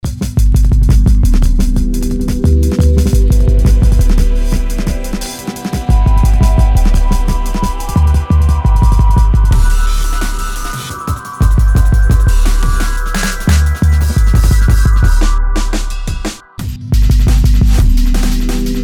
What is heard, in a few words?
GRID SLICE DNB, Breaks Drum and Bass; Grid Machine Slice; Loopmasters